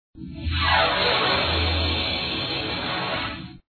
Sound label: beam; elite base jump; Esportes